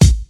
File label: raw kickdrum c key